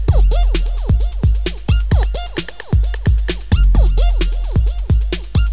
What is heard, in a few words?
electro; Guitar&Electro